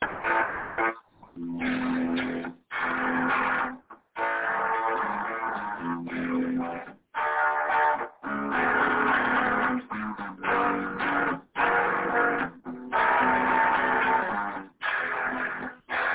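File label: southern rock interesting chords uk 80s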